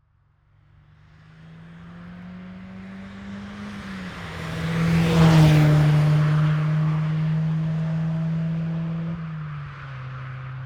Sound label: Pass-By Sports-Car Single; SoundBits